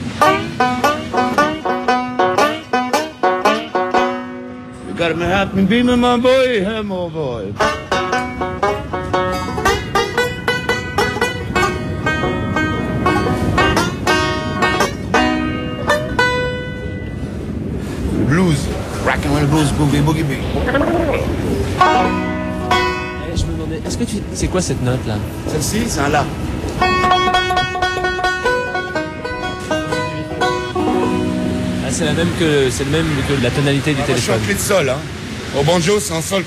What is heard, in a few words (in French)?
Accords du metro joueur de banjo